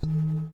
zing

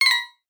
twinkle

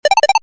heal

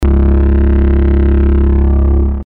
Reaper noise

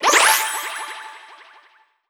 Achievement Unlocked

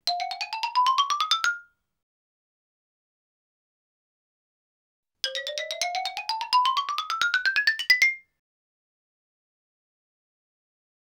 Xylophone Ascending Chromatic Scale, One Octave

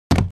thud

